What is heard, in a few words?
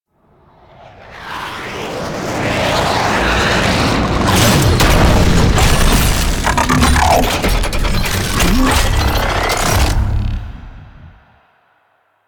Deimos Quest Enemy Mech Enter